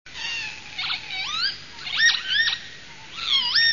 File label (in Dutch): kievit